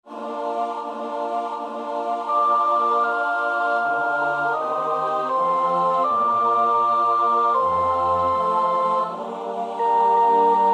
sopraan; Bach (Johann Sebastiaan); BWV 027; 6 Welt, ade! ich bin dein müde

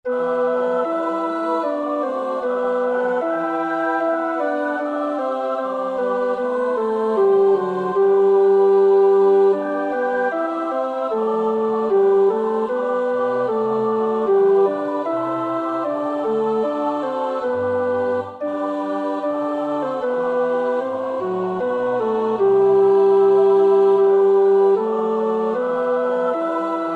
tenor, koorpartij oefening; Bach (Johann Sebastiaan); BWV 198

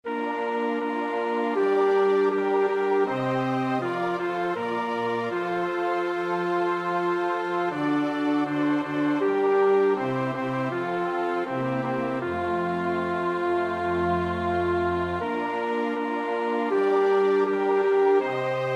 bas, koorpartij oefening; Schubert (Franz); Deutsche Messe (D872)

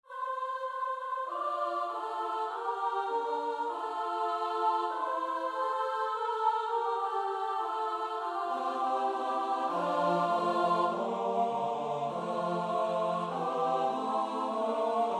tenor 2, koorpartij oefening; Schütz (Heinrich); Geistliche Chormusik Op.11

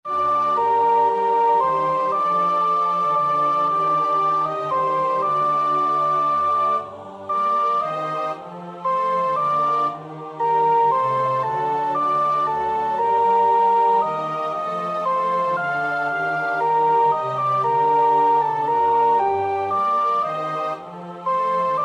sopraan; Purcell (Henry); King Arthur

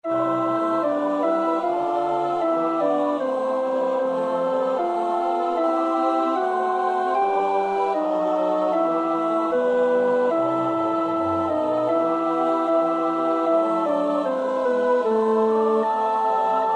alt, koorpartij oefening; Bach (Johann Sebastiaan); BWV 149

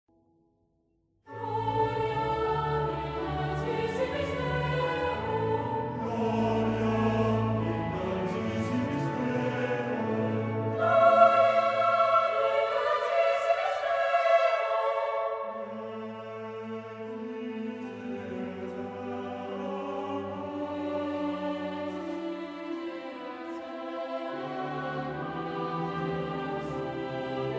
KOOR, CD opname; Saint Saëns (Camille); Oaratoire de Noël

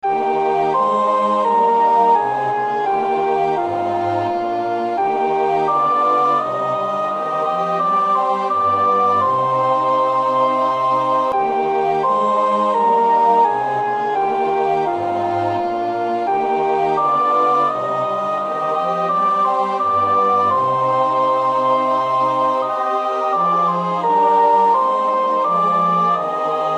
koor 1 en 2 sopraan, koorpartij oefening; BWV 244 Matthäus Passion

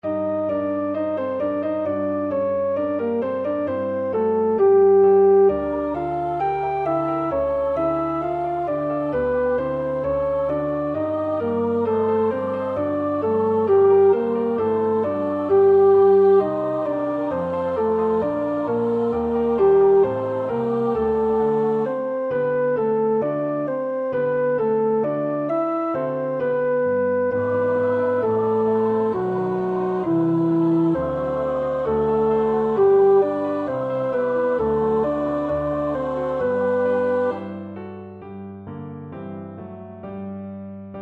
tenor; Brahms (Johannes); Zigeunerlieder; Horch, der Wind klagt in den Zweigen

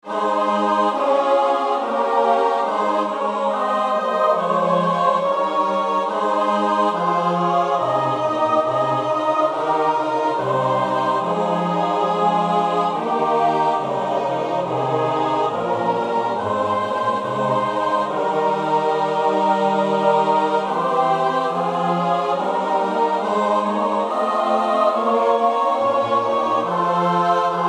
CHORALE koor, koorpartij; BWV 244 Matthäus Passion; No 10. CHORALE- Ich bin’s, ich sollte büßen (koor 1 en 2)

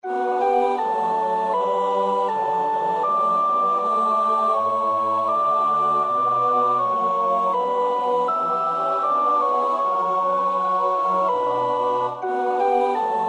sopraan, koorpartij oefening; Bach (Johann Sebastiaan); BWV 103

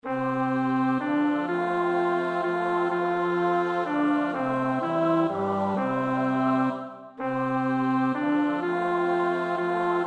bas; Handl (Jacob Gallus)

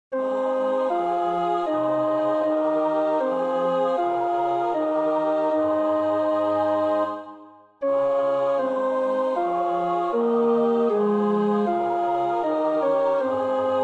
tenor, koorpartij oefening; Graun (Carl Heinrich); Der Tod Jesu

